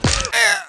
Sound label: fall damage death